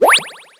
8-Bit SFX & UI - Jump Delay; 8-Bit Complete SFX & UI

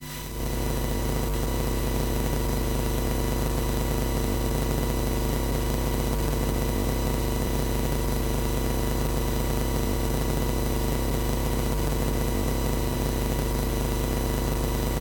Hum, Morse Code; InMotionAudio; Morse Code Samples

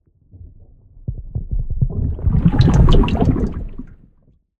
designed water underwater; BluezoneCorp

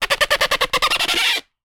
Laugh, Bodyboard; InspectorJ

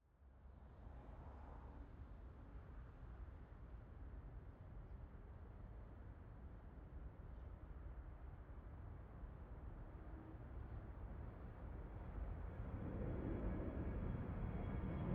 Planes, Takeoff; aXLsound; Planes - Takeoffs and Landings

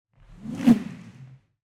Whoosh Source Rope

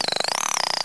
battle talk; hl2; combot

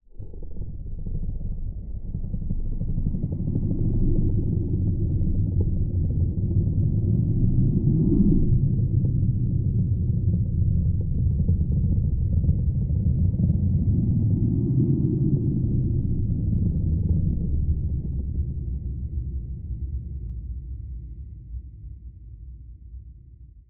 Ambient Drone Groaning Depths; Sound Spark LLC; Metal Souls

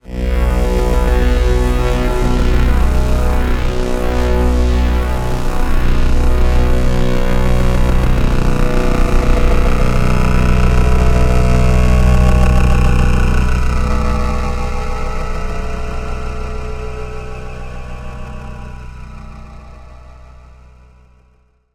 synth, Ethereal Ambient; BluezoneCorp; Eternity

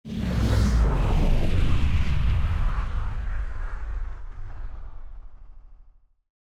Dodge Pass By Movement; MatiasMacSD; Dodge This

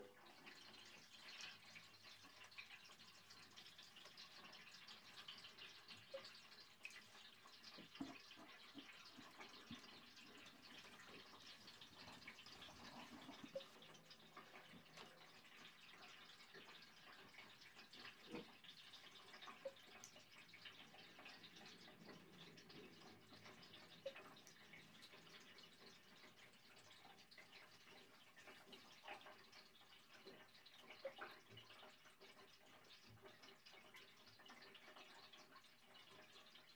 Bath, Tub, Drain; UberDuo; The Bathroom Audio Playset

